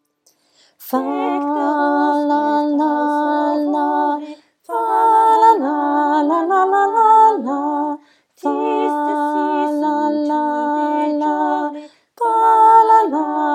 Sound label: Baritone; Deck the halls